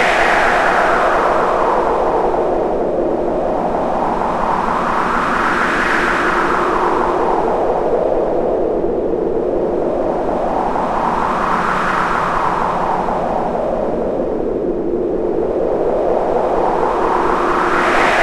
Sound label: wind; TS Underswap